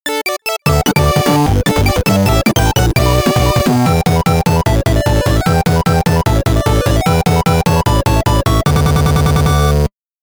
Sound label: 8 Bit Dance